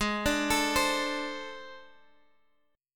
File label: A Minor Major 7th Double Flat 5th